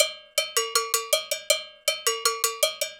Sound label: Cow Bell, Latin Percussion